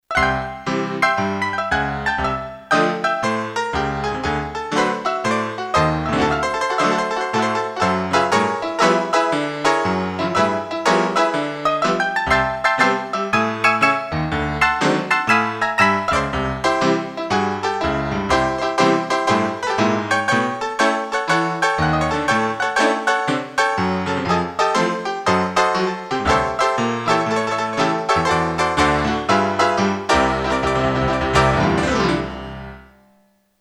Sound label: G. Piano, Piano; Korg DSS-1